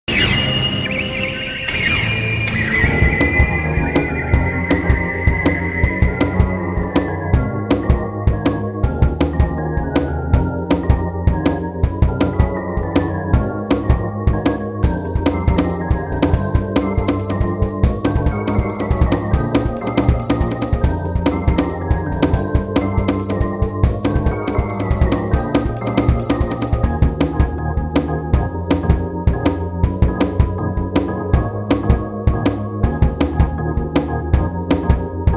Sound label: Music